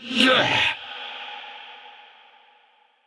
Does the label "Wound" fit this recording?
Yes